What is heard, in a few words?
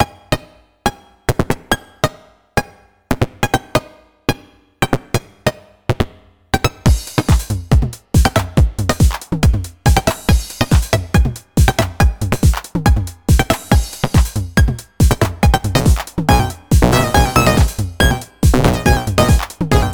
Pocket Operator Modular 400 Sine Drum Beat; Teenage Engineering